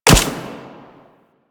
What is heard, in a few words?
Shield Speeder Rifle Fire